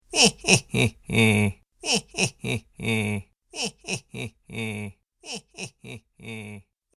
Heh Heh Heh Laugh With Delay, Evil Laugh

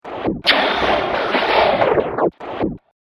Droid Robotic Indigestion, robot sound effects

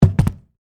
Foley, Suitcase, Set, Down, Hard, Shell, Antique; Samsonite; BlastWave

